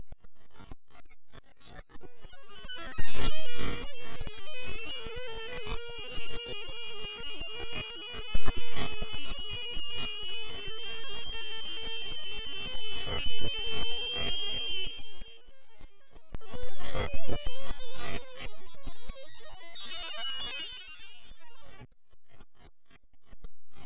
drone; zps

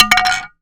Aluminum Collision, Bat; zps; Foley

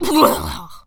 pain, Zombies; zps; Z Waitress

